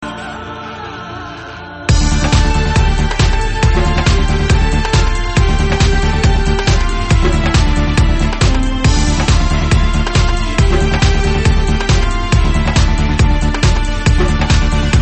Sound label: Instr